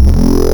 Crush Wub; Xfer Records Serum; Serum Presets

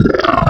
Monster, Spectral; Xfer Records Serum; Serum Presets

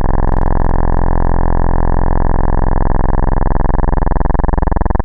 PWM Restricted, Analog; Xfer Records Serum; Serum Presets

